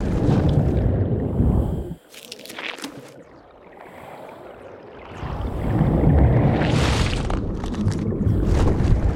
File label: Wing Ice Flow, ambience; Ninjago